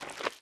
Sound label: slime walk; blocks; Minecraft; Remastered